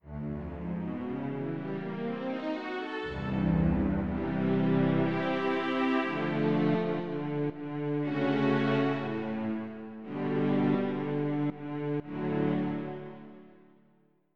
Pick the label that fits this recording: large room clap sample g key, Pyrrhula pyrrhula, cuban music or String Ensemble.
String Ensemble